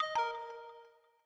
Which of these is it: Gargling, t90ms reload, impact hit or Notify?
Notify